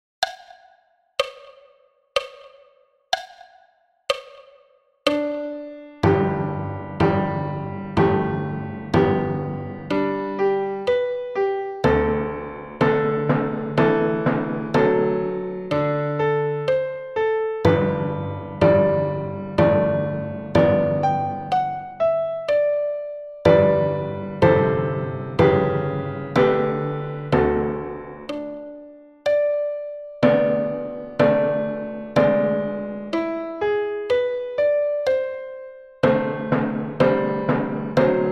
Menuet Haydn tutti a 62 bpm

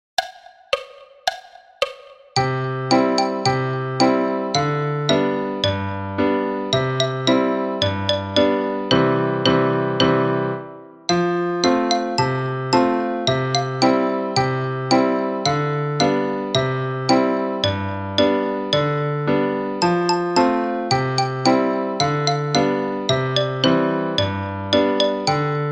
Polka tutti a 110 bpm

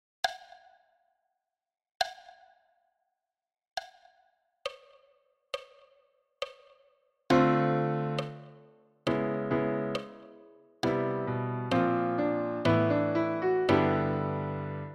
Caisse ballade a 68 bpm

